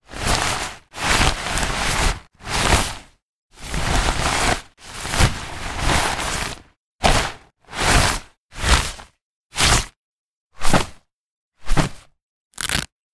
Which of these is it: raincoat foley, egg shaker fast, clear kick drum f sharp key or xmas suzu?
raincoat foley